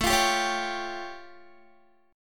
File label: A Augmented 7th, Guitar; 17,20,17,18,19,x; 4E,4A,5D,5G,5C,6F; strummed